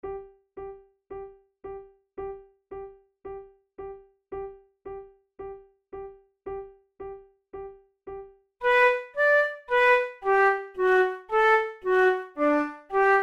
methode de flute; tulou

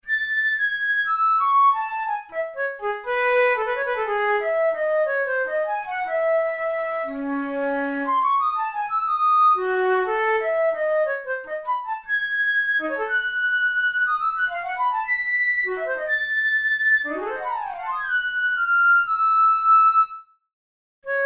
studies for flute solo; karg-elert

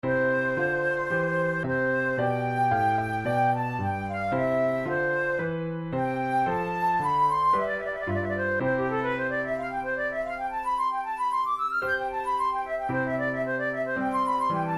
sonata in c major adagio, flute tunes; loeillet de gant op3 no1 i

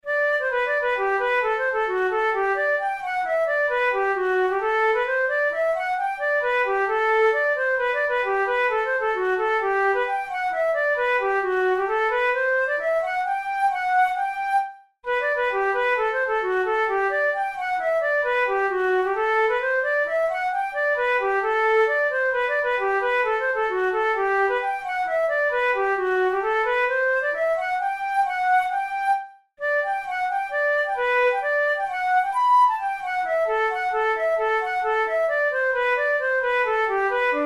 hornpipe, flute

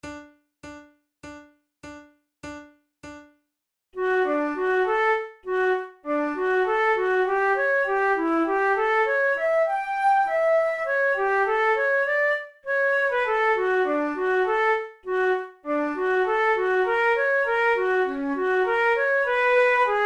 duets, flute; kohler; forty progressive duets vol1 no11

